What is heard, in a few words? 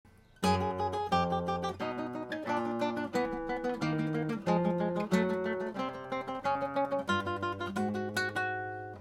variation ascending